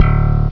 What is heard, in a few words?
Slap Bass; Macworld (1997-09); Games World; Shareware Games; Noah!™ 1.0